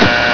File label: button; hl2; buttons